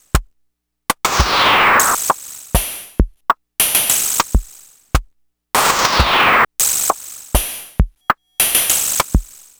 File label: Money Groove, Beats; Sonic Foundry (Sony Creative Software); Ma Ja Le Ethereal Textures